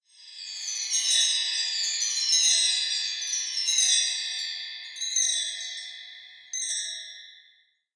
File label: crystal; legend of myst jump